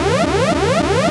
Boom In; MilkChoco

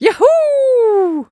Female yahoo; Blastmorph Pinball; xbox series xs

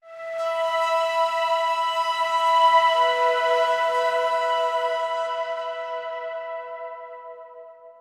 Synth Emin

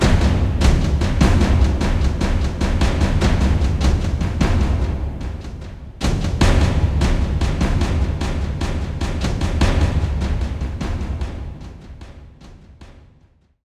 Forest Perc

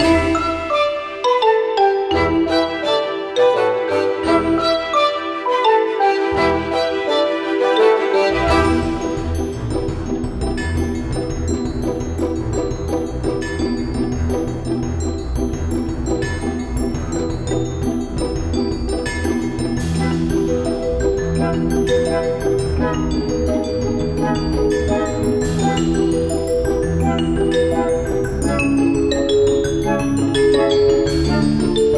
music boss; hackus vexi

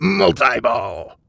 bon triple ball; pirates of the atlantic windows gamerip 2006

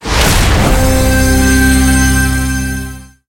win window; aftermath; Gunspell

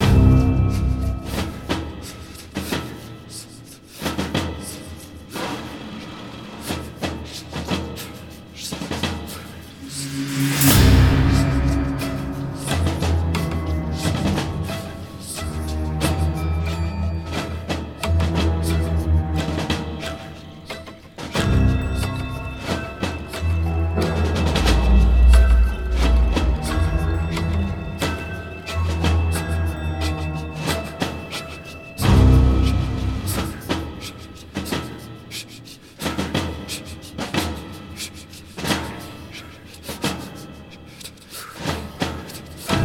Ambiance Stereo Snare + voix

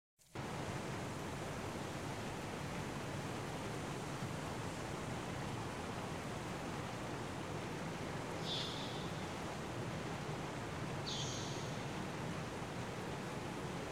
JUNGLE (OVERWORLD MINOR); Semblance

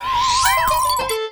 ear shattering singing; Holy Potatoes A Spy Story; special mission skills

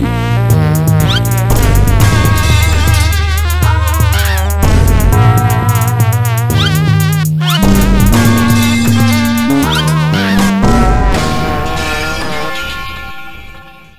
Jingle Trap Remix; pxtunes vs. Game Boy; World Cup Striker

